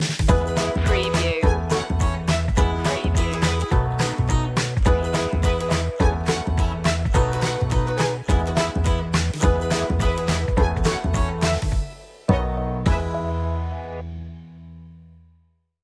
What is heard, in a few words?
be happy